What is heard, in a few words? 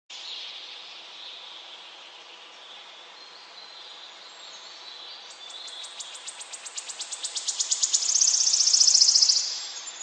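relax birdsong. waterfall murmuring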